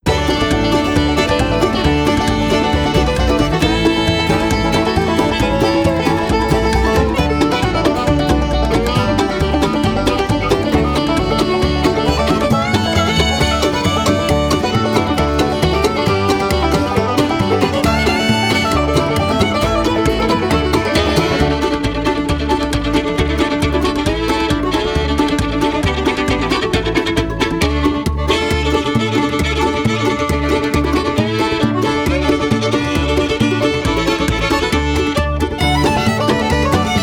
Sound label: Helmet Bump Hoedown; Hardspace: Shipbreaker